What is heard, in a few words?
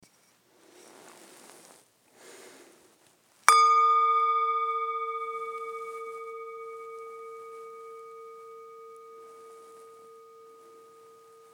9mm bell